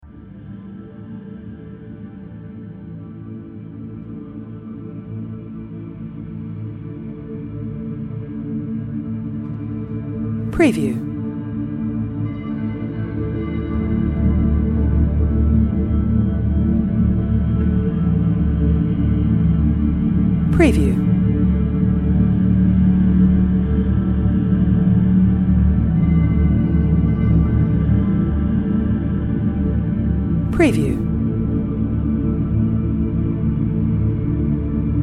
Ocean Ambient Meditation Relaxing Chilling Calm Background Music